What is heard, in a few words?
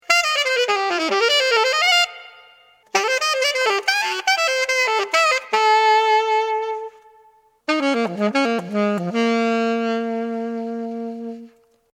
Alto; DrakeNYJA5